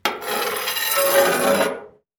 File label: scrapes and scratches